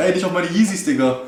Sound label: hurt; spackenmobs; apored